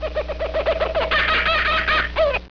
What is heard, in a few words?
kookaburra